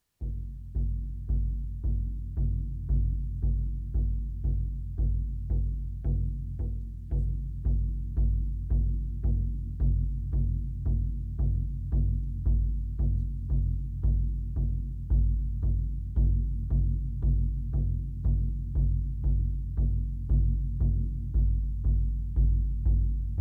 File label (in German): trommel